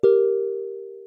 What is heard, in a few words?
harp; phet-io-wrapper-sonification; build-an-atom